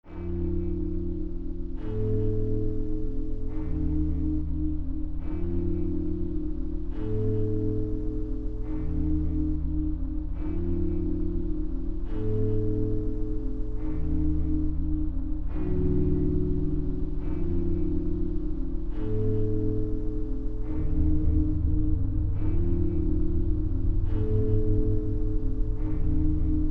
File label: dark; dark-ambient